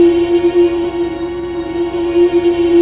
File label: Bass synth build